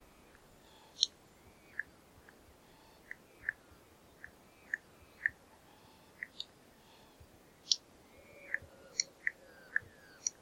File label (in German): tannenhaeher